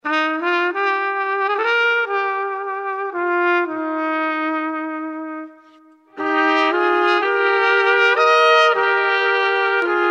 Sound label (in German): Blaskapelle